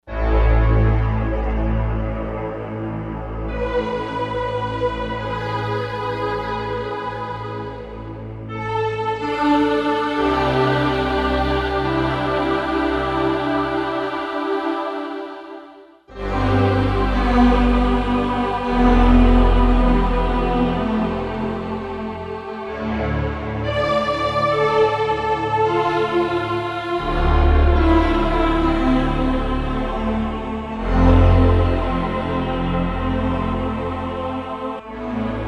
Slw Chor Flng

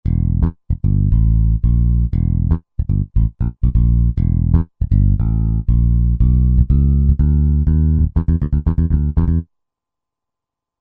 4way, Bass & Drums; Roland SR-JV80-10; John P.4way

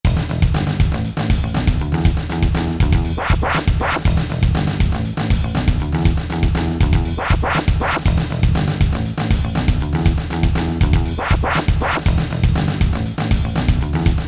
Industry

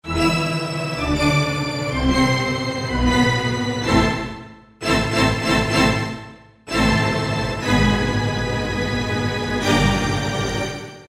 Tremolo, Roland SR-JV80-07 Super Sound Set

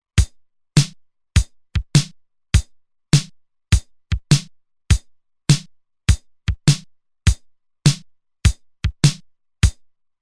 Shuffle, Roland TR-505; Factory Rhythms

